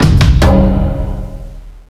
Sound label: drums